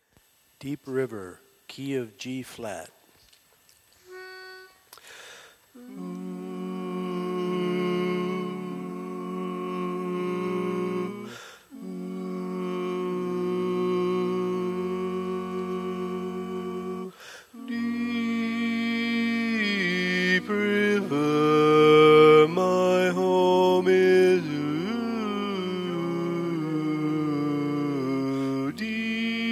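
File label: Baritone, Learning Tracks; Deep River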